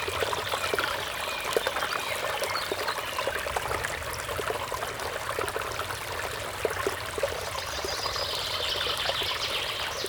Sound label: River Close Birds Forest